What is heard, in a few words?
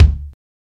punchy kick single shot d key